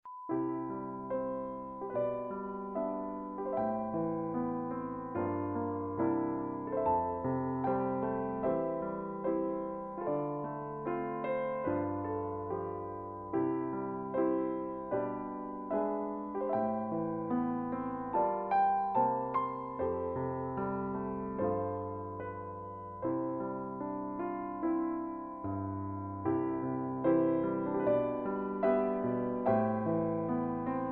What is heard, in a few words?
artless piano